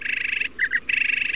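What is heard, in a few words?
mockingbird song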